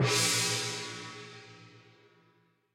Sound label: Gong; Boomerang Fu